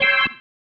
Stab, Organ, One Shots; Sonic Foundry (Sony Creative Software); Joe Vitale Organ Donor

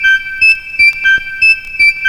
CRAKLICK; AMG - Now CD-ROM (Roland)

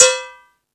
Percussion

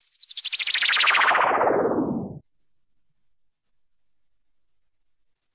INSECT, Special FX Twisted; USB Soundscan; AKAI

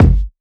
Kick E

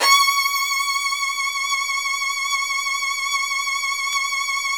Virtuoso Strings SOLO VN C#5; ILIO; Kirk Hunter Virtuoso Strings VOL-1

